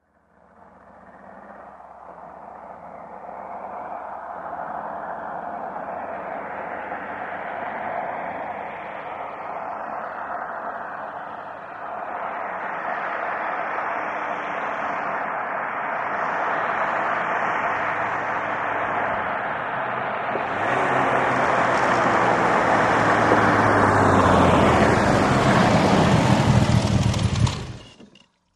VEHICLES ASTON MARTIN: EXT: Approach, switch off, medium, long approach.